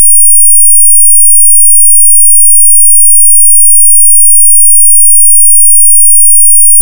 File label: 2ch 48kHz artifact test